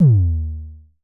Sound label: Mild Thump Kicks D#2, Vermona Kick Lancet; LookIMadeAThing Kicks; Mild Thump Kick - Precise